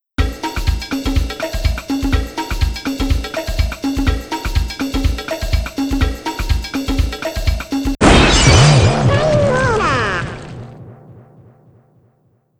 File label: Time Over